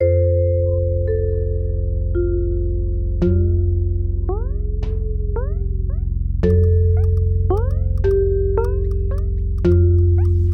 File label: marimbaba